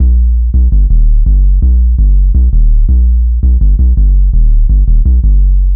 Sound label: Hard 808, Bass Lines 166.5; Jungle Warfare 1&2